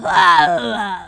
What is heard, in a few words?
death; QUAKE2; female